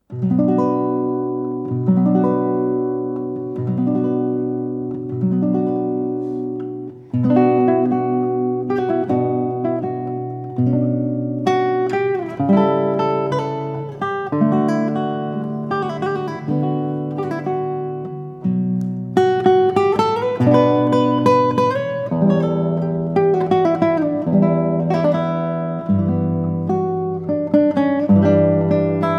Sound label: Aranjuez Adagio Guitar solo